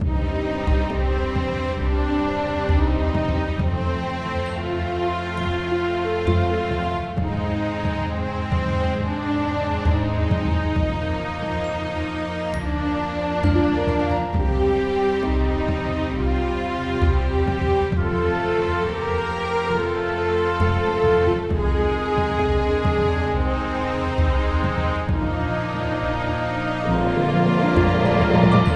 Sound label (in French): Heroique orchestral; brunette music